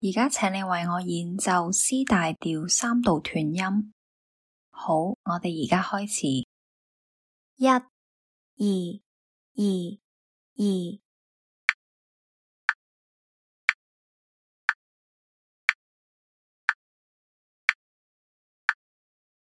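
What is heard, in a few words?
C major scale 3rd apart legato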